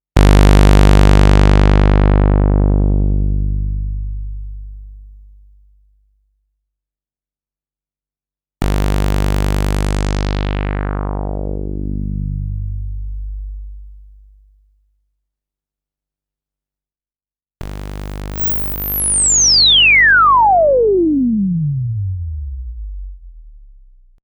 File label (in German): Saw mit Resonanz